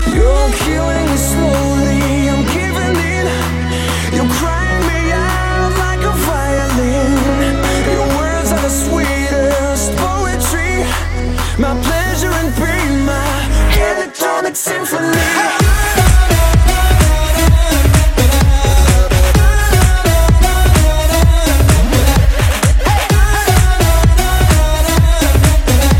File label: electronic symphony, ringtones